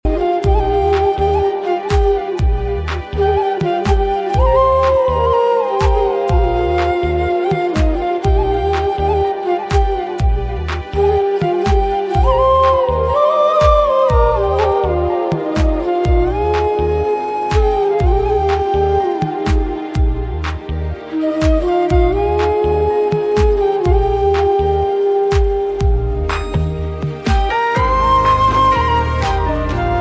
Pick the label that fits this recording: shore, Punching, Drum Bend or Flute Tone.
Flute Tone